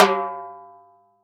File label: Timble Rm, Roland SPD-S